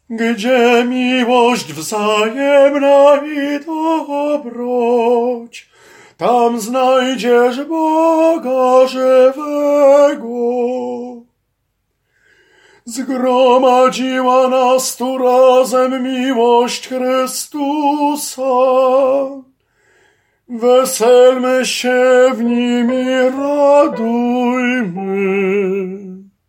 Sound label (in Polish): TENOR; Gdzie milosc